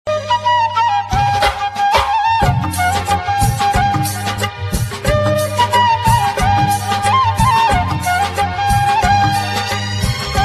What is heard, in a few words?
flute ringtone